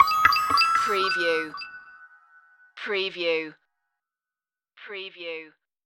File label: retro video game tomorrowland stinger